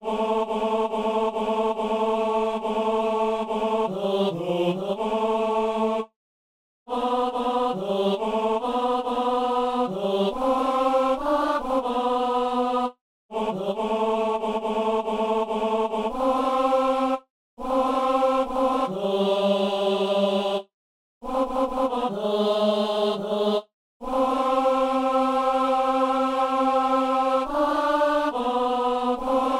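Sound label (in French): ténor; Pain pour l'homme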